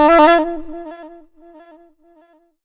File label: SAX RIF; E-Lab; X Static Goldmine 2; akai; SAX RIFF2